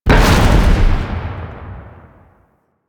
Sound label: Street Barrel Explosion; Warframe; Nineteen Ninety Nine